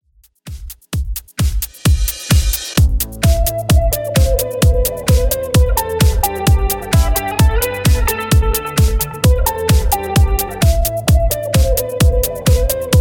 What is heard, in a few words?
Club Extended 130Bpm Clean